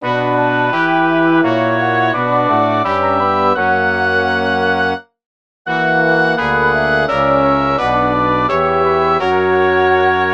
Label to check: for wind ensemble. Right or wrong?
Right